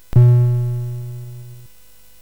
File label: Frantic, CSG8580R5